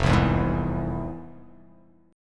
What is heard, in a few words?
F Minor Major 7th, F